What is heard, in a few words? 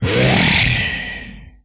inhuman growl, vocal; retro arcade; MMRetroArcadeSoundsPack